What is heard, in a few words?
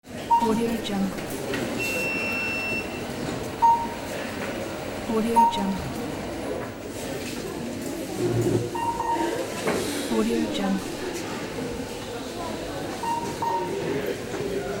supermarket ambience